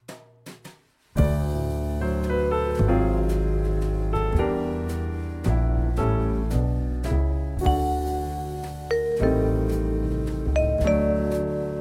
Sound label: bells